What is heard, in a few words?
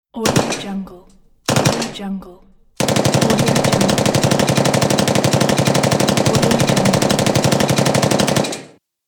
machine gun; wistansound